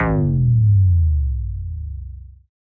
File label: Bass Drop; SoundsCrate